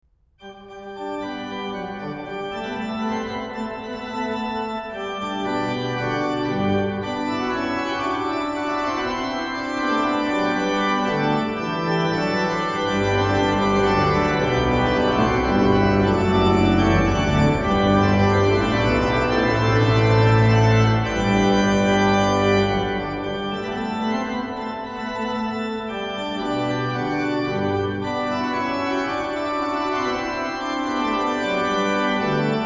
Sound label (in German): LUKAS, Digitalorgeln